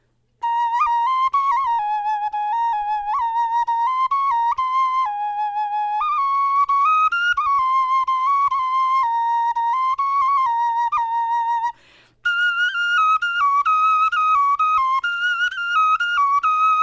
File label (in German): melodie